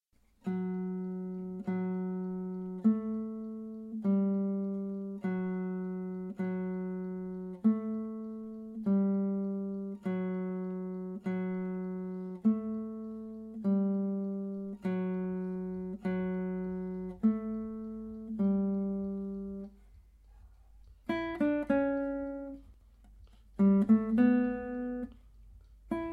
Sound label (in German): gitarre